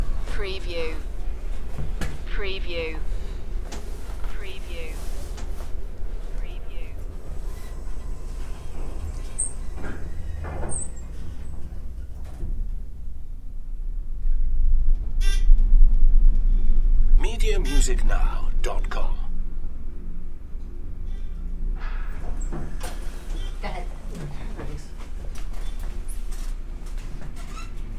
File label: Elevator Ride